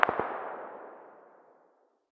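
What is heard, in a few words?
light machine gun very far distance; tacz-tweak-pack; taczmechanics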